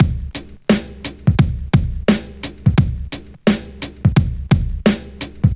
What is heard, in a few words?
87 bpm 00s drum groove C# key